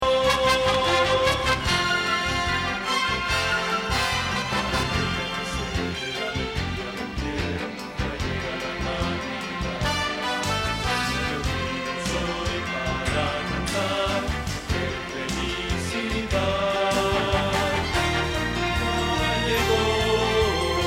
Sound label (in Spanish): Bajo